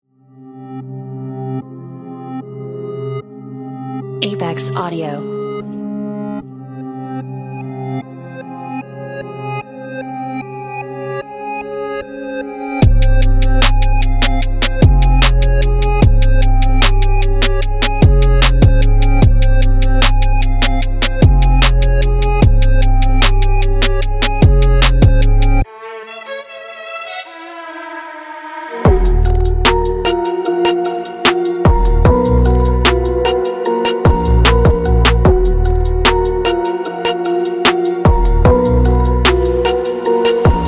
Trendy Trap Beats; Hollow Bundle

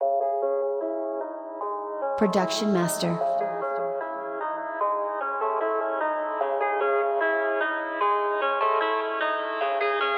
Modern Trap Beats; 100 Certified Trap; production master

